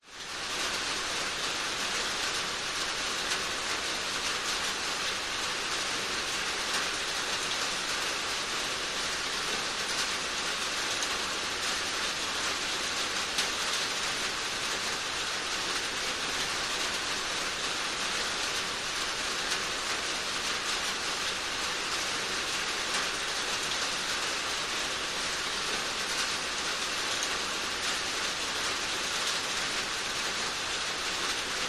nature rain medium on metal storage container roof